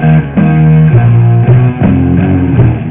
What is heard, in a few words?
dx bass